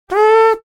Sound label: making trumpet sound